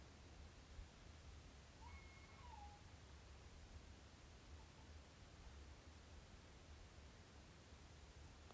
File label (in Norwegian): kaklelyd